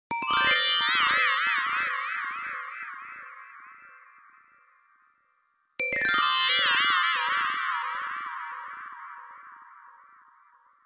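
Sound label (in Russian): мультяшный звук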